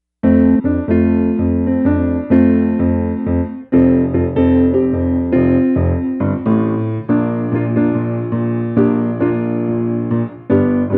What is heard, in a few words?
Etude Gospel